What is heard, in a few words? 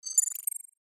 save mood click